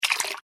water pour shallow, Water Poured Into Shallow Water; Pouring; Water Poured Into Shallow Water 2; Objects; McKinney Sound